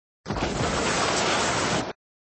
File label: River boat snow slide